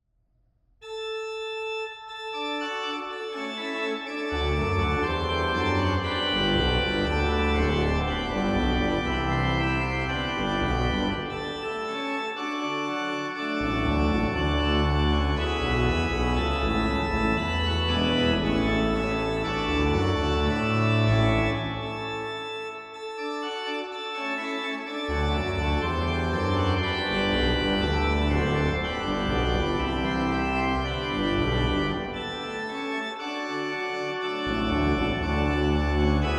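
Bach Es ist das Heil uns kommen her BWV 638 Front HW+RW plenum, organ; schiedam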